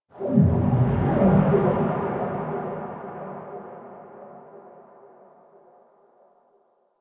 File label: Railjack exterior space waves; Ambience; Warframe